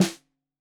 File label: Snare 65 Reg Stereo, Deeply Sampled Drums